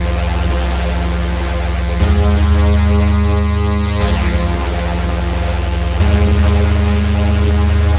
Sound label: Waver String; Sonic Foundry (Sony Creative Software); The Electronic Music Manuscript Collection